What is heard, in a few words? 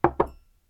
place glass water